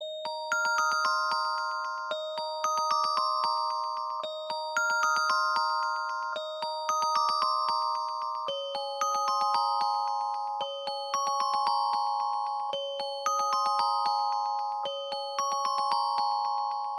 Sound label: old nokia 3310 ringtone